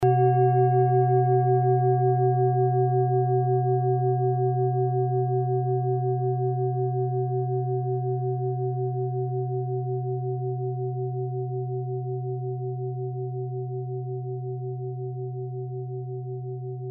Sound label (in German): klangschale tibet; Tibet Klangschale Nr.40, Planetentonschale: Meisterton